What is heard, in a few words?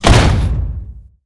metal impact